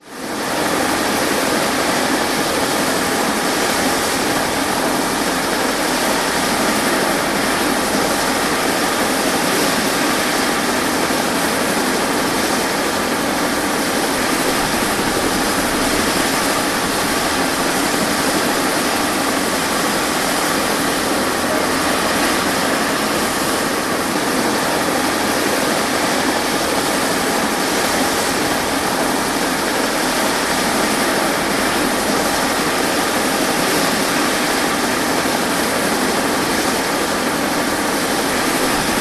vehicles helicopter idle close by as350 b2 squirrel